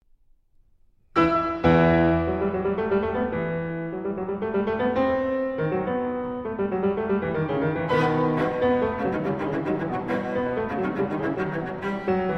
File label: Sonata For Cello and Piano in E Minor, Allegro; No. 1; Op. 38 III.